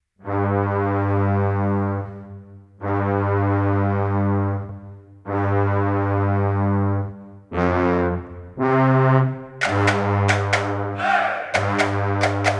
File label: Funky cold, guggamusik